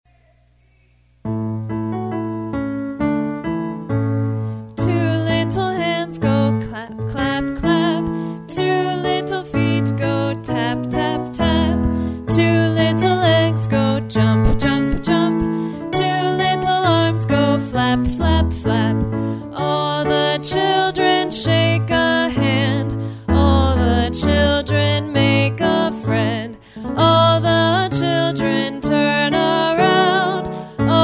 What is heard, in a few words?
vocal